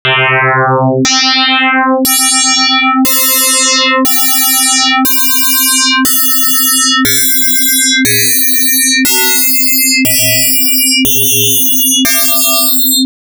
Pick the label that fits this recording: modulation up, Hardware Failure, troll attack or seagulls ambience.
modulation up